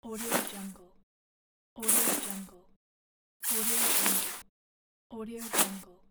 clothes; andrewsound83

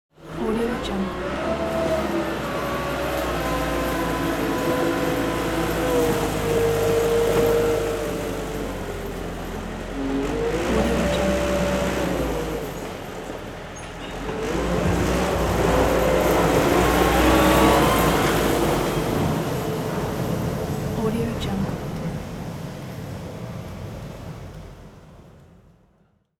bulldozer

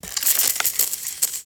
dry ice sizzles

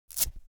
foley velcro open cap fast

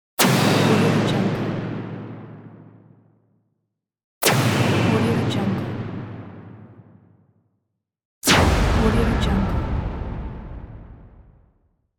trailer hit sound; kanda101

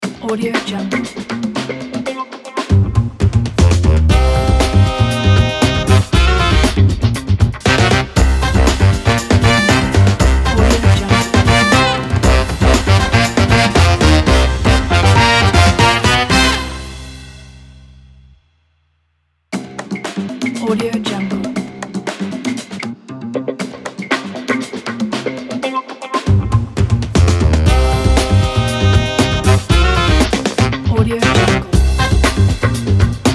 the upbeat jazz funk

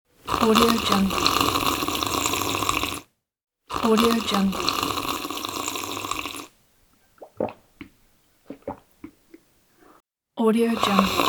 slurping

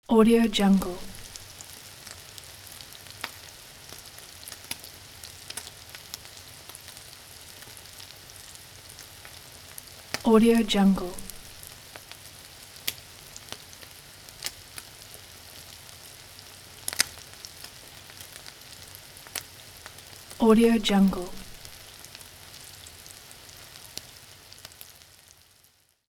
sizzling

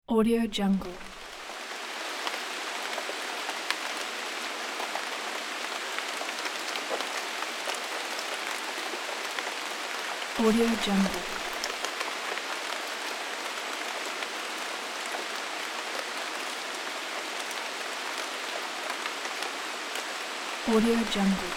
light rain; vinyl sounds

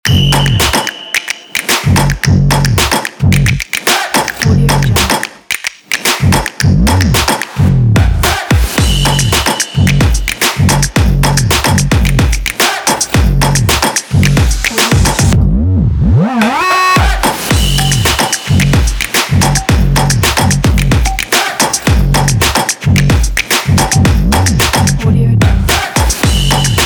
football promo; marblespace

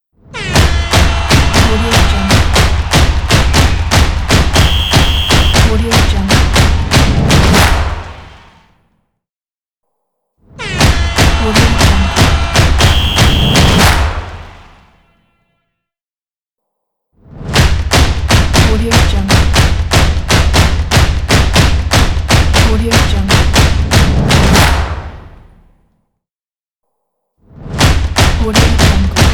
soccer stadium opener logo

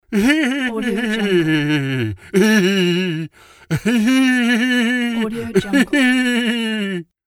cartoon male laugh

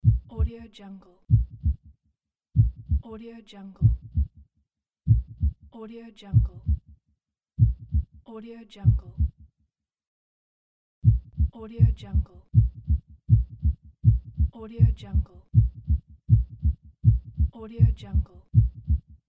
heart beat

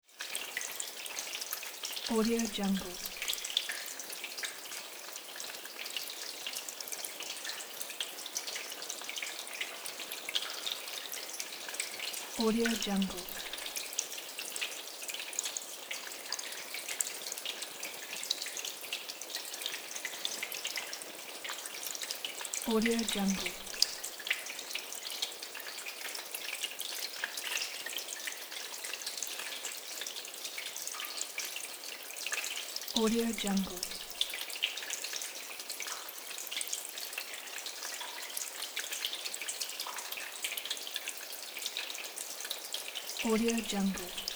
water trickle

